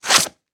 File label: Foley Footstep Slide Boot Single